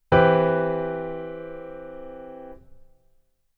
keyboard voicing

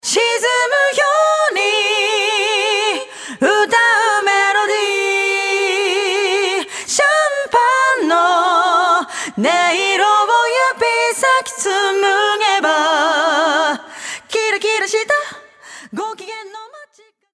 Jazz Waltz Cho Add Hi

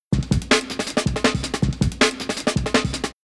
Rhythm DnB Loop BPM160

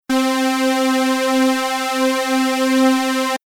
Mix Pan Mono L

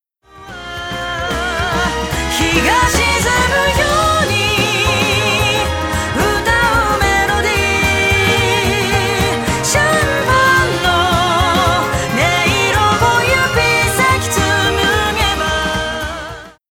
EQ Filter High Shelf Filter

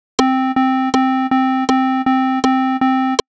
Rhythm Note

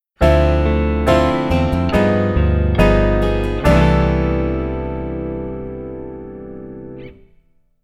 Keyboard Voicing Rootless

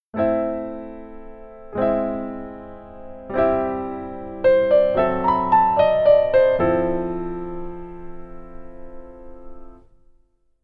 keyboard

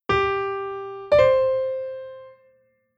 grace note

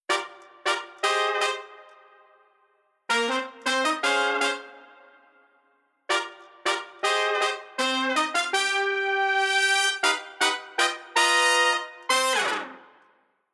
Horn Back Horn Only

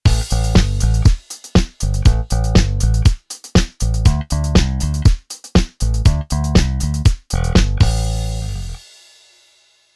Bass Disco

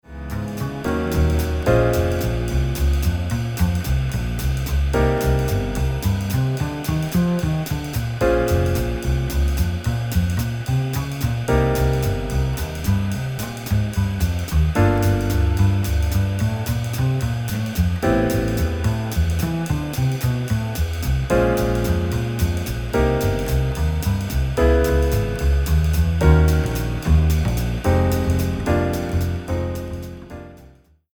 Jazz Waltz Sketch Bass Chorus

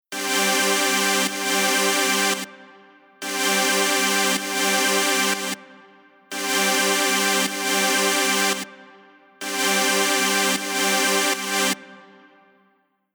Chord Close; EQ Comp Basic

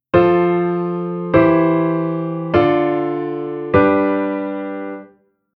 Chord

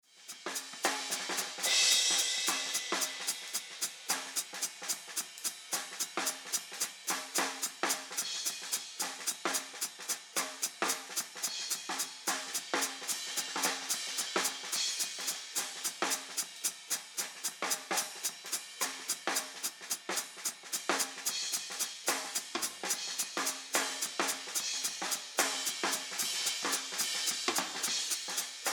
Mix Drums HH Ride HH EQ On

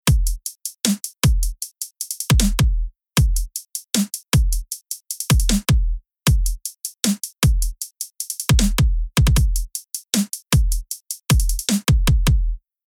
Future Bass Rhythm SD Short

